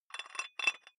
dumbbell thread take off small weight soft, fitnessstudio; sport freizeit